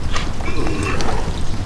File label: horse